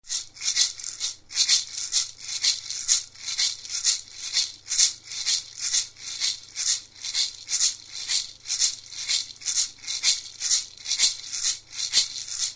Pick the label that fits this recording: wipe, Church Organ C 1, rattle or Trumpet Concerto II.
rattle